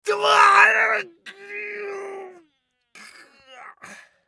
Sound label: scav death; eft